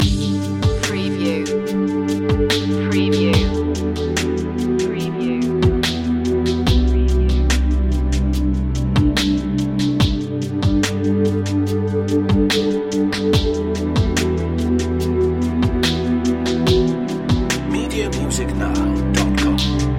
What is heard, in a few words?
square drops loop